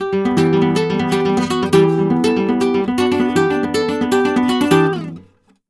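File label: arpeggio guitar, Flamenco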